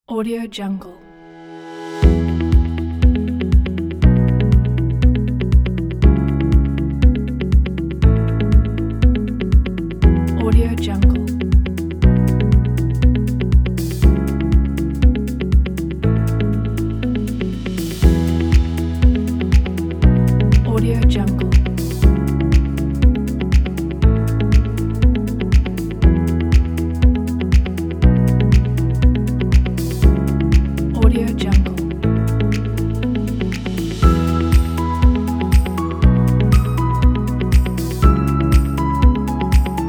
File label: uplifting optimistic background corporate